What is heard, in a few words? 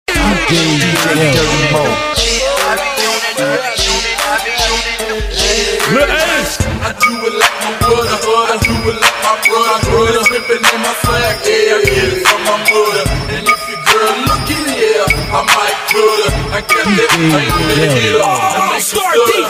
hip hop